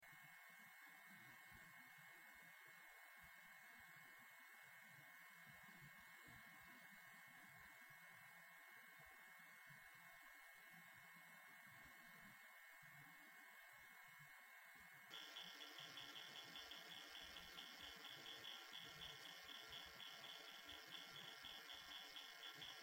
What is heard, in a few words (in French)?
western raptor 74